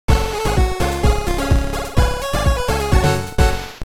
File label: Samba!; Princess & Conquest v0.20.06